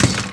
metal chair hit; bouncyobjects; Tony Hawk's Project 8; XBOX

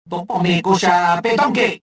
anger

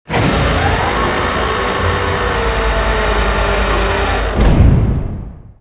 door large; movers

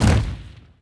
boulder land dirt; impacts explos

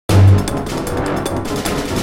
Fight; Silas; The Da Vinci Code; XBOX

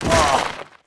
FALL, Rider

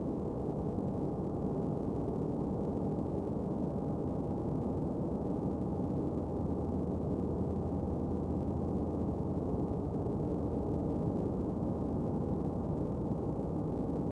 theed Stone Room ambience; Star Wars Obi Wan; XBOX

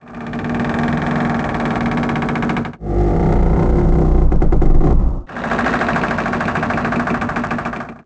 ship aground; shipcharge; Pirates Of The Caribbean

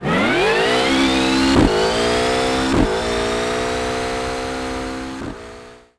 Punto, tarmac; Colin McRae Rally 3; XBOX

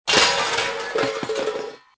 metal fall; Soldier Of Fortune II Double Helix; XBOX

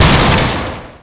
bonk metal; terrains; Tony Hawk's Project 8; XBOX

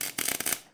amb int arc; Tony Hawk's Project 8; XBOX

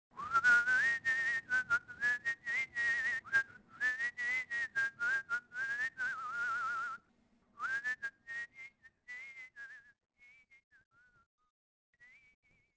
whistle; Soldier Of Fortune II Double Helix; XBOX

